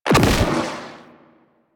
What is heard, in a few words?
Space Fighter Dredger Jelly Rocket Fire; Rail Jack; Enemy Ships; Grineer; Agent